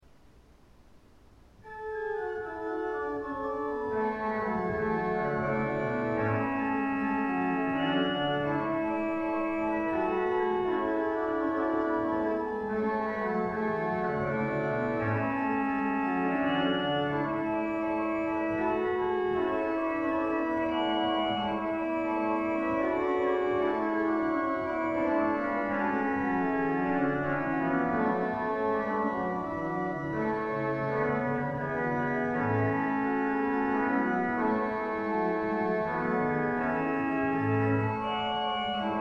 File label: In dulci, Organ; Orgelbuechlein